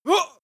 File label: male groan pain short soft; menschen; schreie